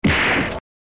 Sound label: chute; Ben 10 - Alien Rivals; ThrillGames